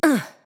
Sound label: Grunt Jump; Kinessa; Paladins